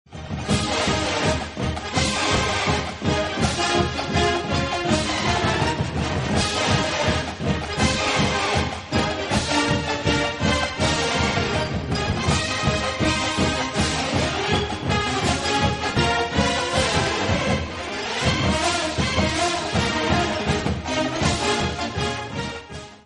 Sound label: Marsh; آهنگ های زنگ خور موبایل